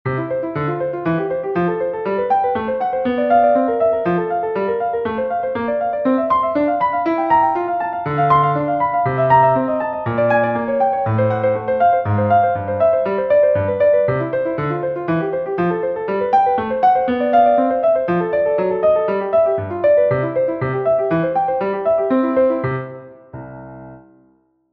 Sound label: Etude, Scale Harmonization Study